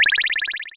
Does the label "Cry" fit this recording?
Yes